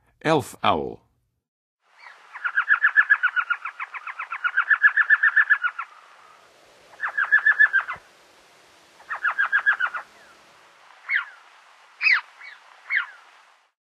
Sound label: Elf Owl, Songs of Birds of North America; Western USA; Western USA Songbirds